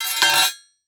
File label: Sword Drop Slide On Ground, weapons; AdobeCC